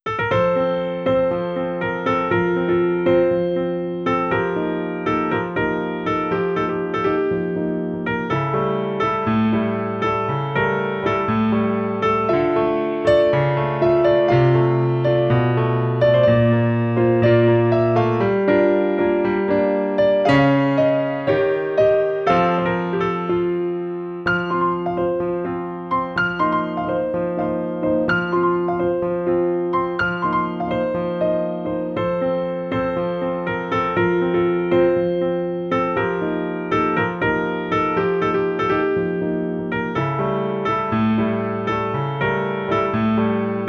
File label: Piano Version